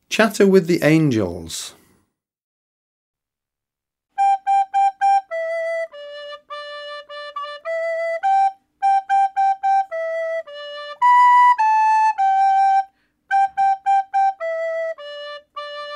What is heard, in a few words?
Recorder